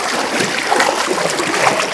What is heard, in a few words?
WR swim, swim